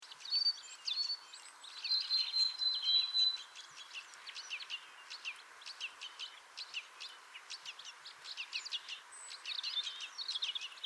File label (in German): Weidenmeise; Wank GAR; Michle Pron